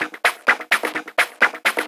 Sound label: FX Loop 128 BPM, House